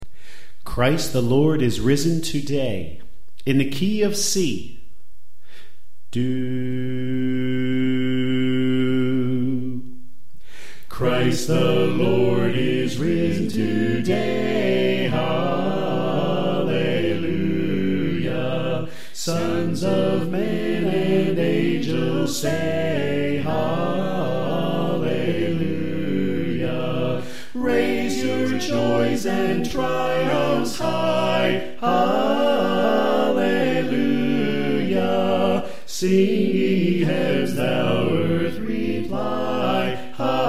Christ The Lord Is Risen Today Tenor Left

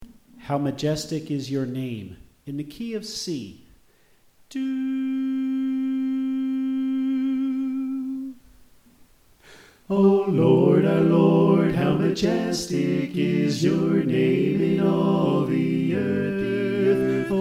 Alto Left

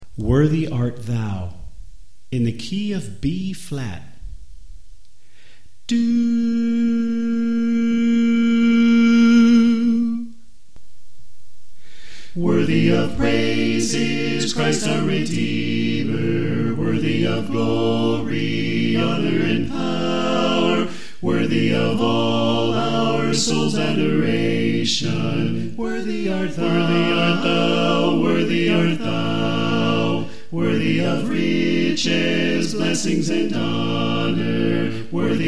Soprano Left

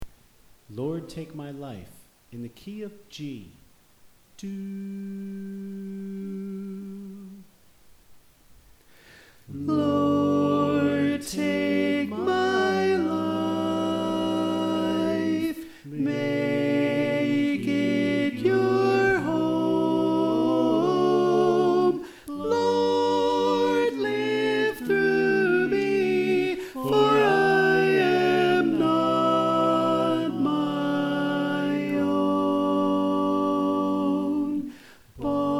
Alto

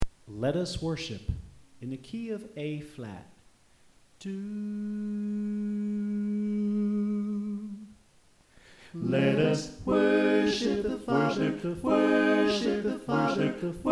Soprano Left

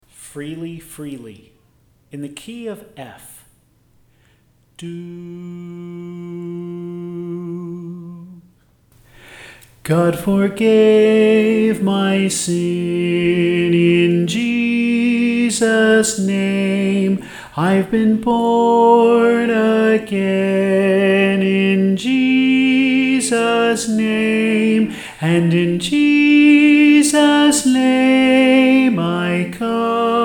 Soprano

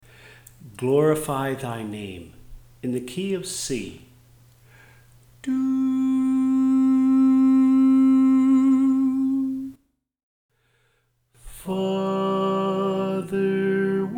Tenor

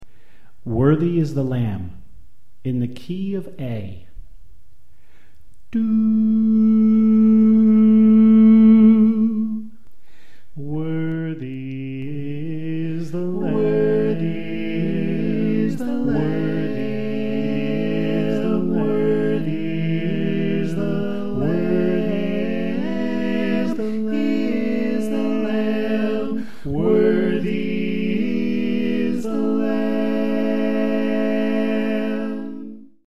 Women Left

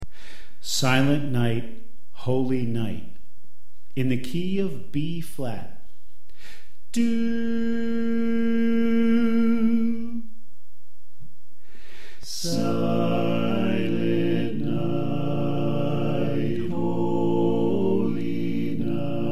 Bass Left

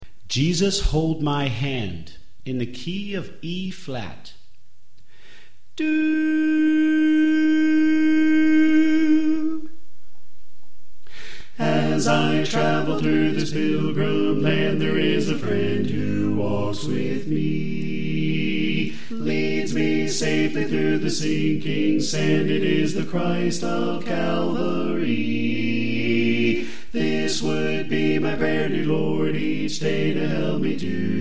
Alto Left

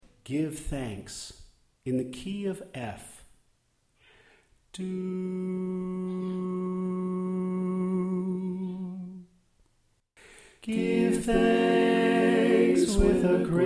Alto Left

